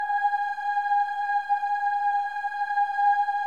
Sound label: BOYS OOS; Spectrasonics; Symphony of Voices